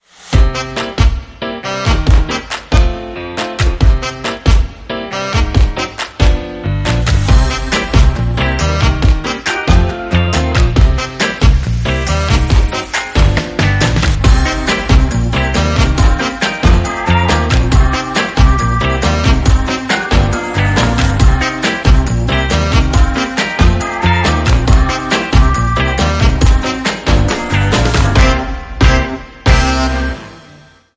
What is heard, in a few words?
60s Soul Fusion